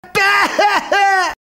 Gülüş Pehehehe; Osman Aga

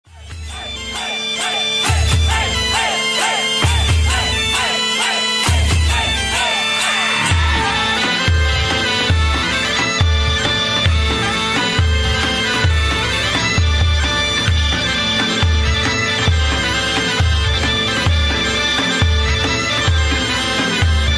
Mehter

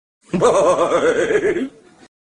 Gülüş Böhöhöyt; Recep İvedik

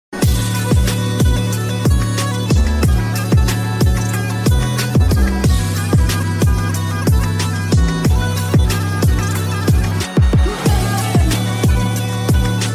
Karadeniz kemençe

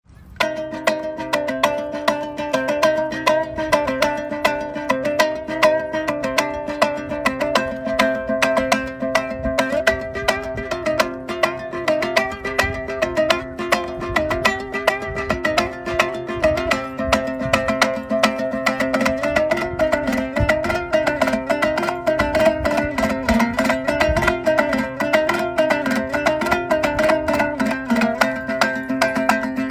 Türk Turan Melodi; zil sesi

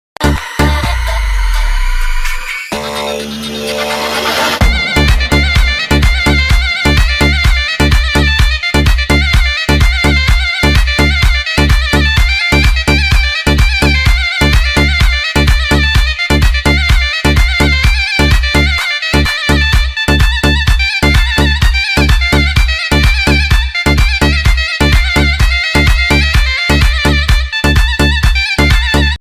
Davul Zurna Halay

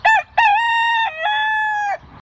Güzel Horoz Ötüşü

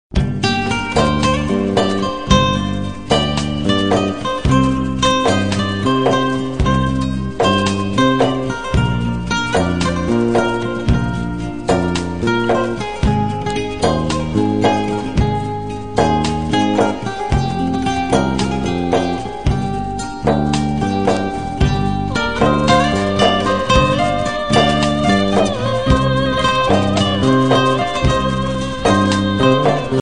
Fon Muzik Huzur

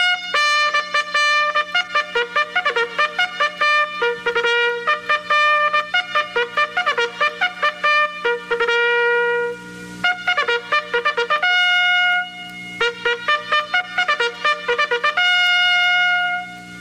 savas saldiri sesi hucum sesi trompet

